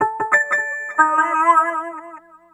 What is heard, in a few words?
Guitars, Dmin; Total Drum&Bass; 90 s Sample CDs